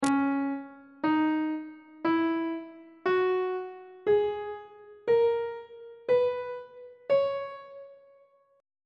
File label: c sharp dorian mode note positions; treble clef